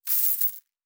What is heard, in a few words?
Coins; Fantasy Sounds Bundle